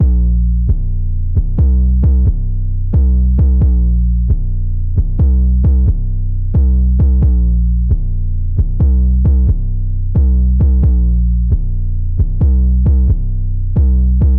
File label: 133 BPM Bbm